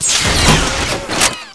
cart head open, weapons

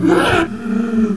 zombie die

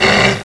zombie pain

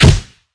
bulldog shoot, weapons

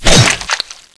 hit normal

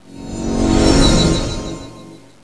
teleport on

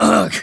pain

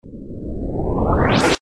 exit, bullet time; mb bullettime

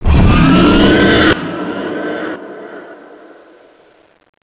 dragon scream

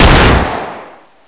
m4a1 unsil

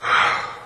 exhale

